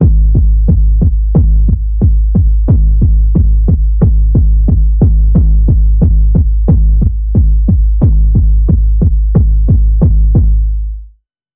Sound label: Underwater Pulse Wave G sharp